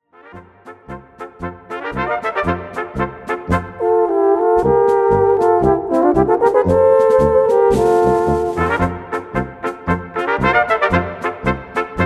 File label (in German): Polka